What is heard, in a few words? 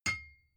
hammer hit